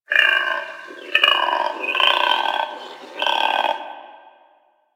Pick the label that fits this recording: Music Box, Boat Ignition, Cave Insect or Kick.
Cave Insect